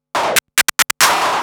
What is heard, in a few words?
Scratch Fills 140BPM